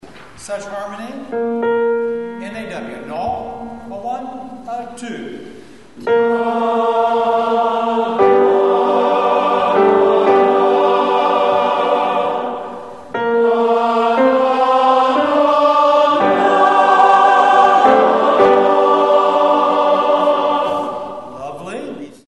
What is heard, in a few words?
Serenade SATB notes